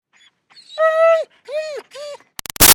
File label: Dog German Shepherd Whine Pleading; Dogs; Animals; Big Room Sound